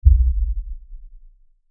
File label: LFE Hit, Lfe Hit Short Impact; Hit Bells Reverb Production Element Imaging Element Accent Transition